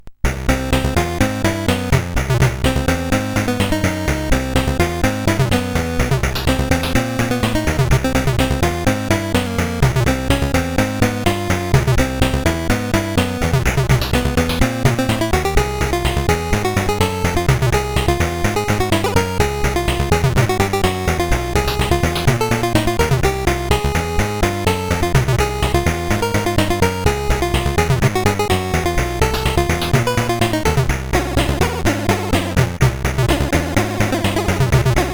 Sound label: Fugue